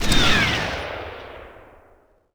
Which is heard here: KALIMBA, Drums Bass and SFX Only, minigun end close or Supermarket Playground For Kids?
minigun end close